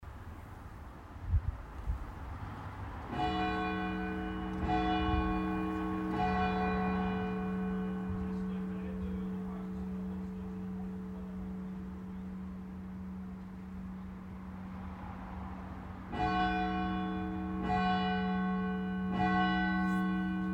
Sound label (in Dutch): Klokken Angelus